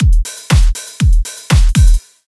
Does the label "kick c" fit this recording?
Yes